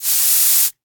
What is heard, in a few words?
empty spray; CosmicRage; ratika